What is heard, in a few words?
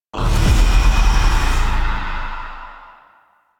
UI Kill All Enemies; Warframe; Missions; Zariman; Zariman Assassinate